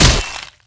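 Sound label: damage sword bone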